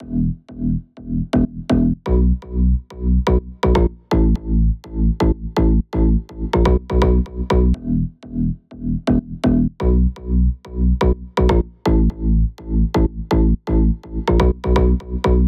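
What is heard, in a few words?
bed bass E minor; 124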